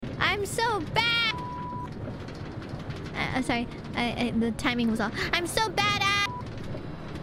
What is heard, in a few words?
badbeep